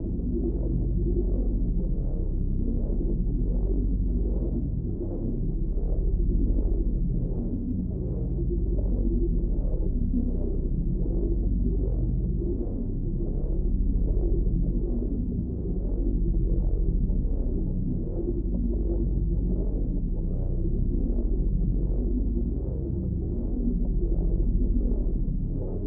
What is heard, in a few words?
Drone, Cyber, Deep, Pulse, Disturbing, Invasive, Loop; Light and Dark Drones